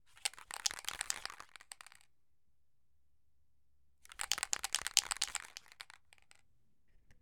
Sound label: Shaking Paint Spray Balloon